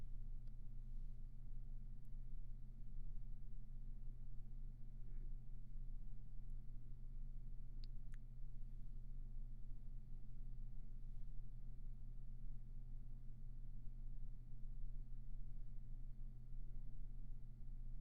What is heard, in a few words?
Room tone